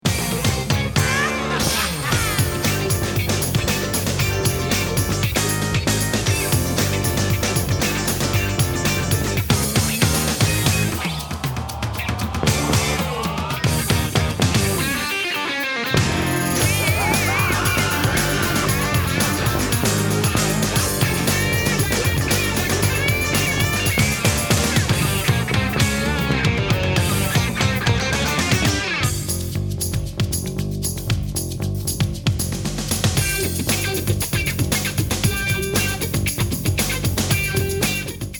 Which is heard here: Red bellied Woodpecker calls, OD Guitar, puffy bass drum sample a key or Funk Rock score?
Funk Rock score